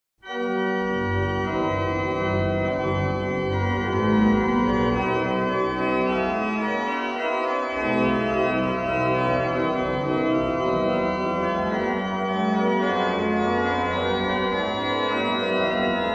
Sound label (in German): Orgel; Bach; Orgelbuchlein Dies sind die heil'gen zehn Gebot BWV 635; These are the Holy Ten Commandments